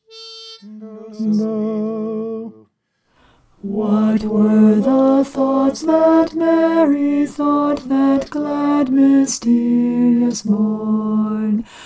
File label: alto